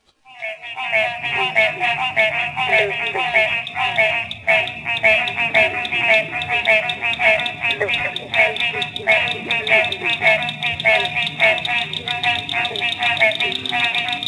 Call - Green tree frog

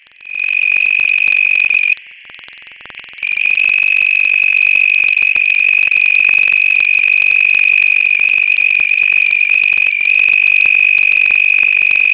Call - Southern toad

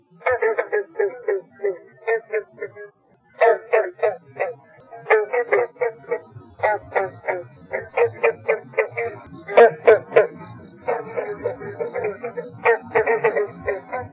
Call - Green frog

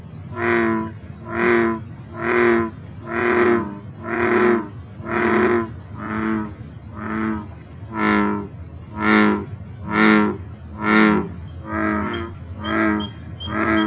Call - Bullfrog

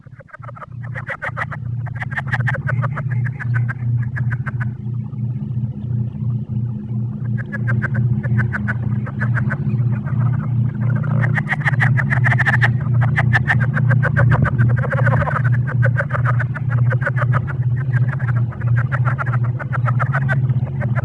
Call - Southern leopard frog

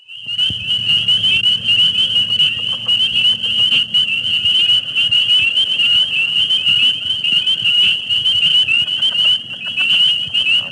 Call - Spring peeper

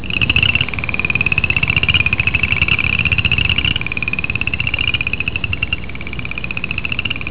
Call - Southern Chorus Frog

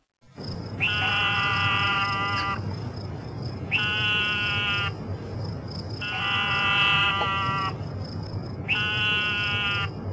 Call - Eastern Narrowmouth toad

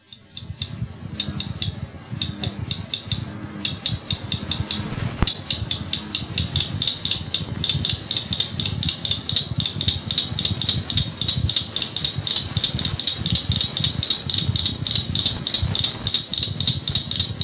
Call - cricket frog

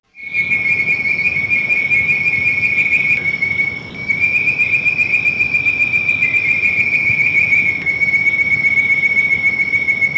Call - bird-voiced tree frog

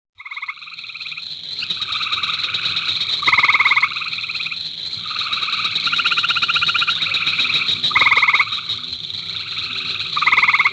Call - Gray treefrog

